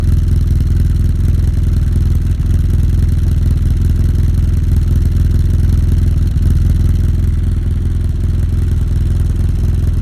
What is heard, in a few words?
F800GS Racing Sound; F800GS - SR Racing Sound 5